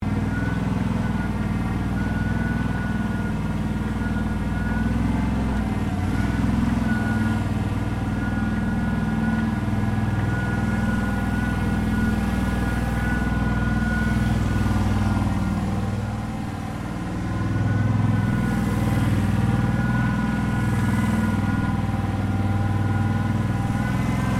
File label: Cleaner Honda Gxv530 Polyvac Maneouver, Gas powered floor cleaner; Cleaning Sound Effects; Cleaning (Sound Effects:Household)